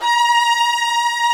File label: STR VIOLAS, Roland LA Composer strings; Roland - LA Composer vol. 1